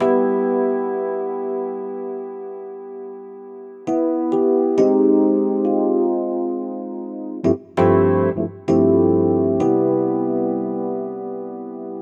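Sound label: 100 Pop Mark II C major, E-Piano; Pop Rock; E-PianoClav; Pro Tools Essential Loops; Digidesign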